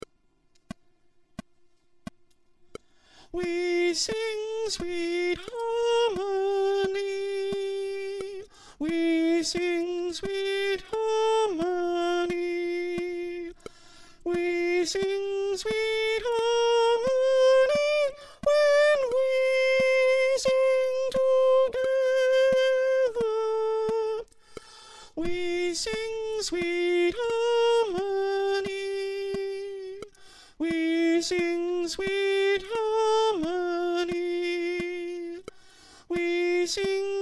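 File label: soprano part, choir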